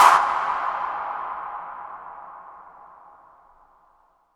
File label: IMP SNR DRUM, Drum, Cinematic Impact; M-Audio Pro Sessions VOL-25; Liquid Cinema